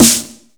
snares; Vengeance Samples

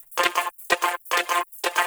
Vocoder Loops 128BPM

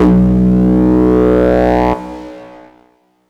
FX Alarm; Vengeance Samples

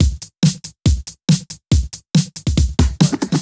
Breakbeat 140 BPM

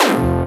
Synth Sound; Vengeance Samples; VEE Synth Shots

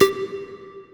Synth Cuts; Vengeance Samples; VES1 Instruments

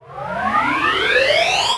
Uplifter

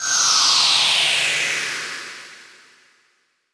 Downsweeps

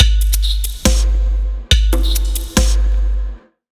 Drumloop; Ghosthack

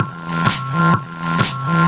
VFX2 Loop 128 BPM

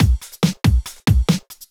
Loops BB 140, BreakBeats; Vengeance Samples

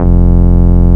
Root F, Bass

